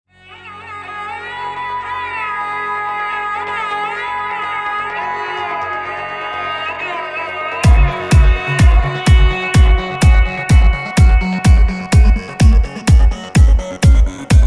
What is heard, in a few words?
Tone